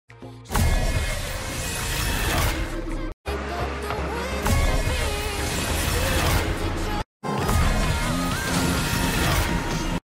gravity bomb

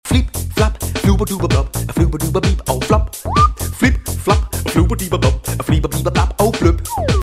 flipflop song

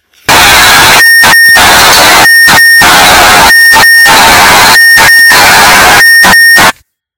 Mazda louder chime

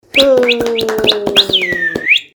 applauseee

